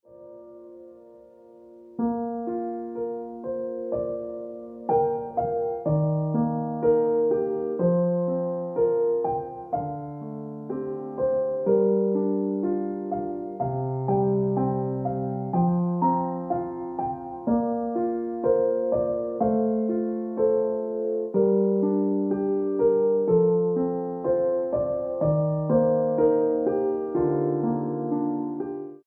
Ringtone Piano Music

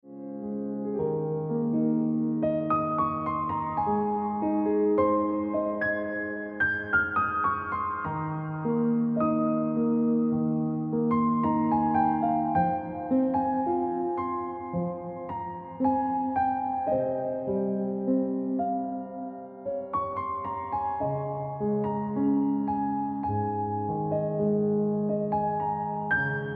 Ringtone Piano Music

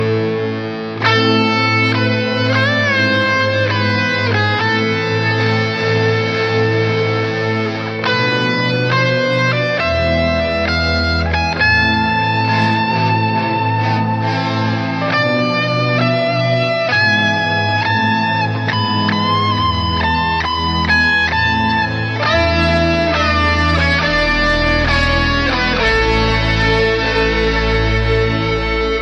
Pakistan Anthem Pakistani Ringtone

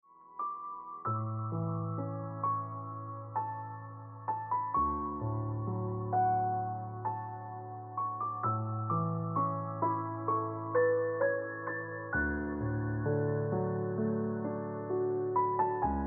Ringtone Piano Music

